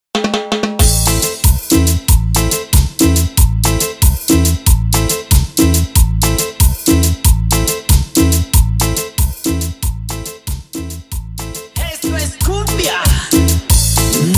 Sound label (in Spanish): Intro Simple, 93 Bpm